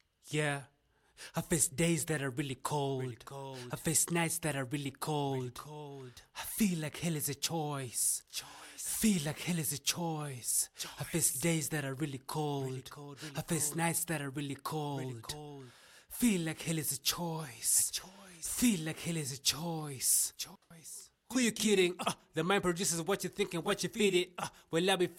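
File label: hip hop, 100, C major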